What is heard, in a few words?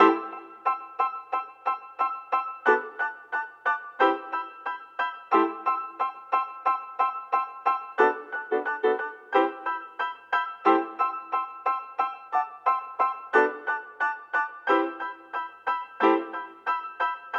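90 piano rhythm A minor; times gamma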